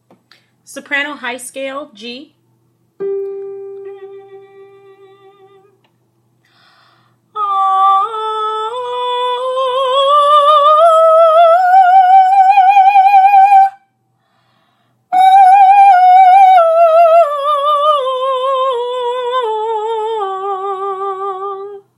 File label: soprano high scale